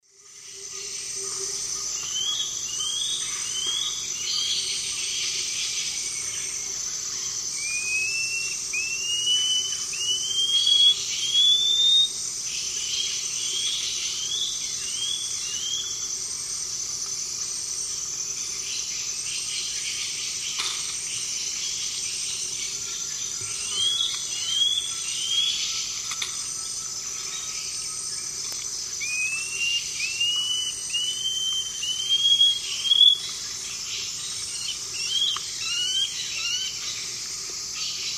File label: Hylophylax poecilinotus, duet; S. America; Eastern Lowlands